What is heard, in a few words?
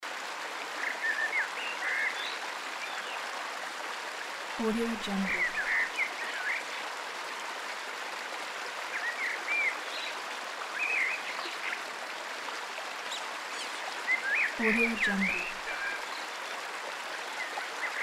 forest ambience with stream and bird